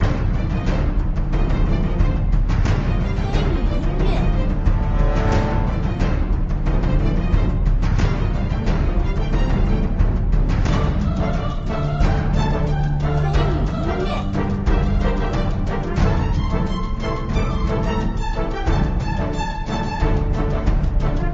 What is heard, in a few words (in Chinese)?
竞技场战斗